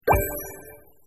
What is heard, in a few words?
trophy unlocked; Delicious Emilys True Love